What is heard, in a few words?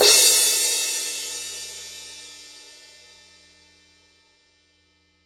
18 medium crash edge, paiste; pst 5